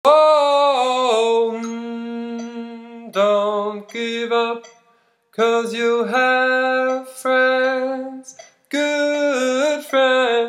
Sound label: Dont give up Chorus Alt